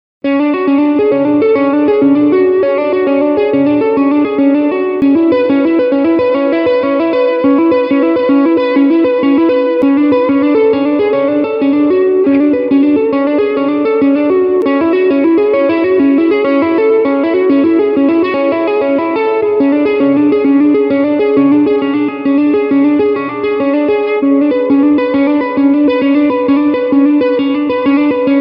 Finger Tap Arps; VGS

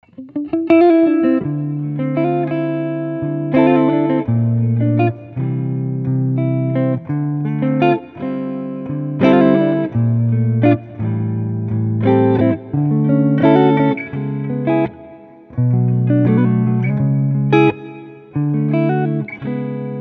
R&B Guitars

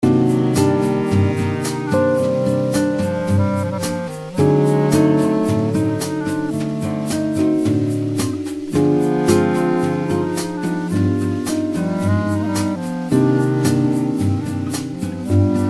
Bossa Nova Grooves

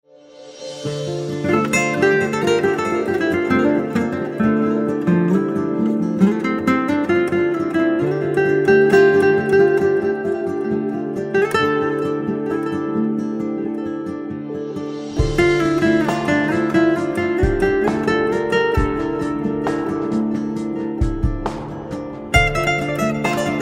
Chilled Violin and Guitar

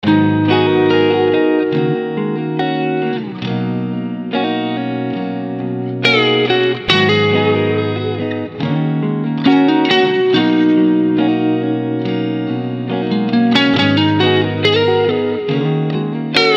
Laid Back Guitars

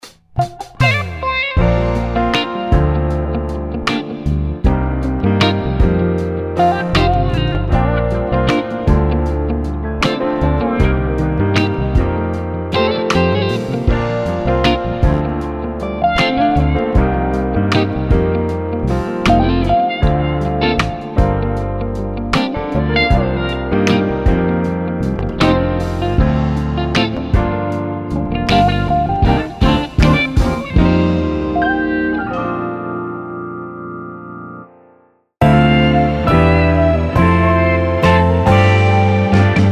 Soulful Retro Grooves

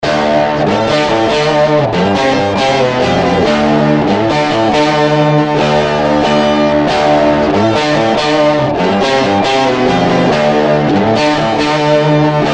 Rhythmic Rock Guitars; Rhythmic Rock Guitars Vol 1; Vanilla Groove Studios

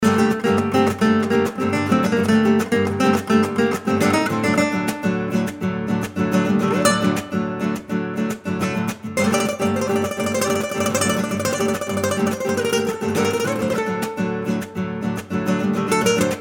Smoking Hot Latin Guitars

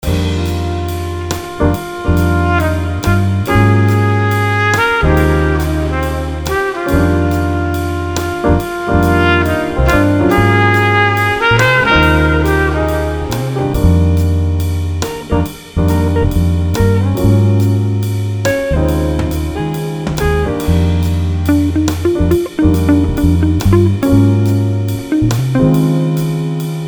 Jazz Components

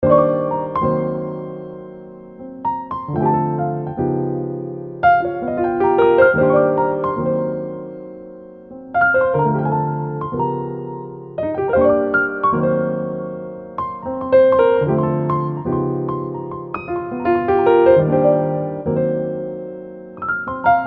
Smooth Piano Grooves

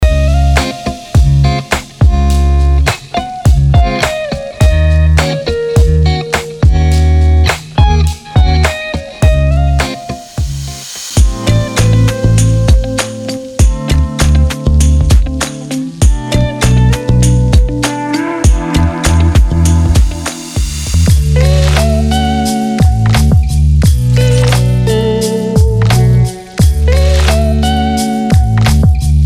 LoFi RB Grooves

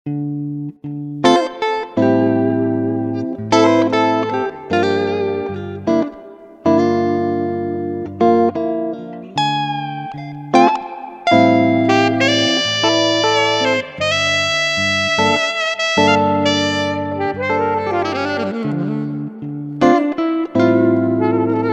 Chilled Guitar and Sax

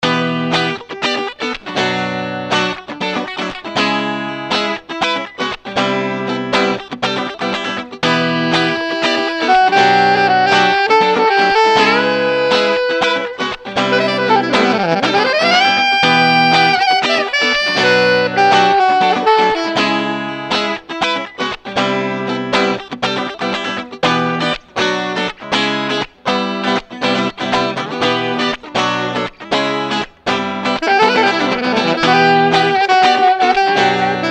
Funk Hop Guitar and Sax

